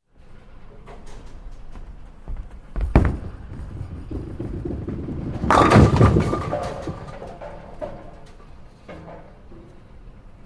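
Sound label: bowling pins strike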